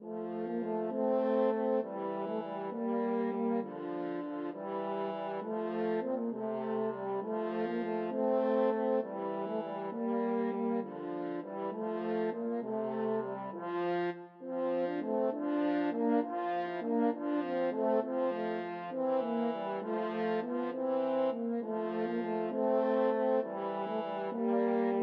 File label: french horn duet